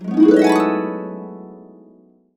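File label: E major, Harps; USB Soundscan vol.62; AKAI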